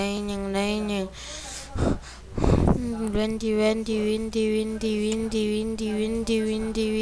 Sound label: windy